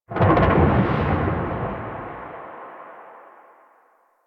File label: Thunder One Off, ambience; Warframe; Sacrifice; Vitruvian Memory